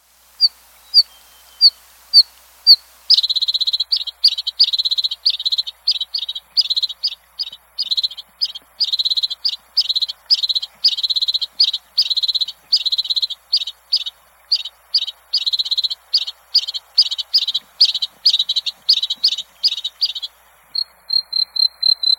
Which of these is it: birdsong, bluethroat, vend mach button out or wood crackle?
bluethroat